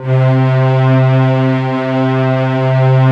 strings C3; STRINGSTK; Greytsounds; Scott Peers Sound Engineering

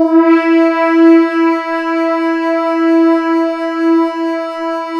brass lead (E); Sonic Foundry (Sony Creative Software); Ugly Remnants VOL-2

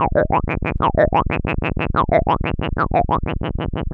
LFO, Noise; Sonic Foundry (Sony Creative Software); Prototechno

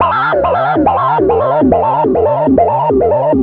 Analoop, Industrial

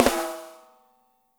dry, snares; Spectrasonics - Burning Grooves CD2

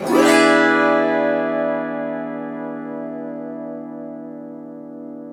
AUTO HARP, Auto Harp Min 7

